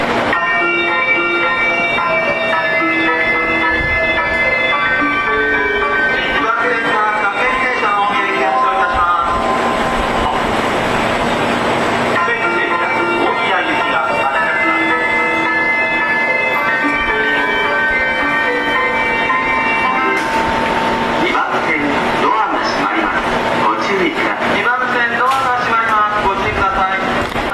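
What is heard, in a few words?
Departure Melody; 埼京線・湘南新宿ライン; 池袋・大宮方面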